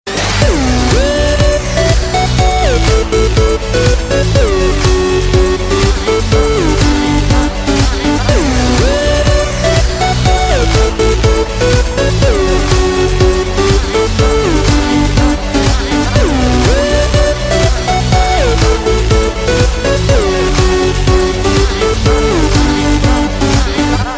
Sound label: Party Beats